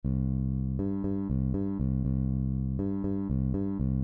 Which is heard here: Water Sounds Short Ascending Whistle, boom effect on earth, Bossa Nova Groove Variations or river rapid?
Bossa Nova Groove Variations